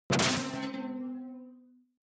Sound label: sci-fi alien bounce detuned bright